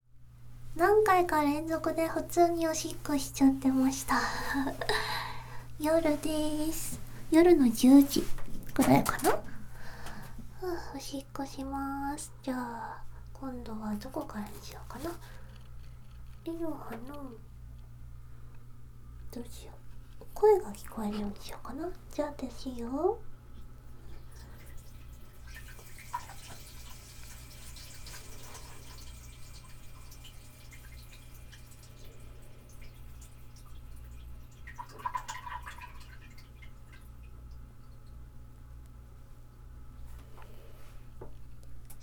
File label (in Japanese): おしっこです！